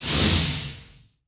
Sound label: Damage Poison; Knight Online